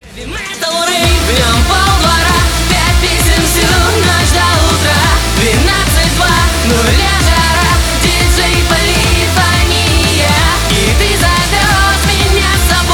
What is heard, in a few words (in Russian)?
hevi metal rejv